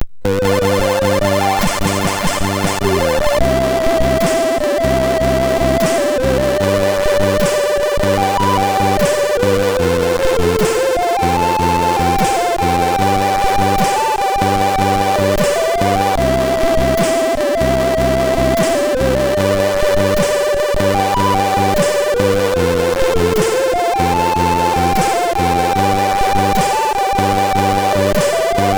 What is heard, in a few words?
sid, MOS6581R4